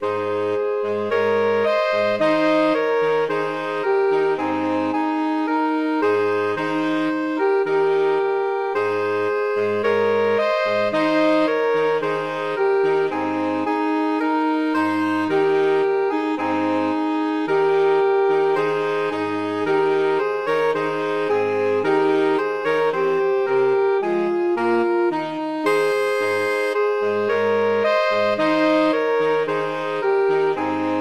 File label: ode to joy SAX